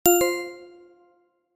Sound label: Bright positive click